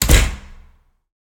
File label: door big close; 3maze; Doors